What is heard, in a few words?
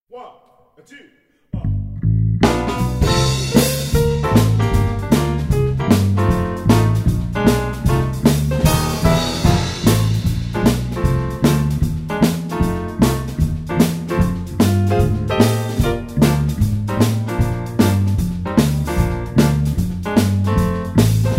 This Little Light Of Mine (Backing); ADIOSES SHOW CHOIR; CALENTAMIENTO; LET IT SHINE